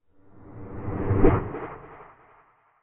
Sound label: Fast Science Fiction Spaceship Flyby Transition; Crafted Signals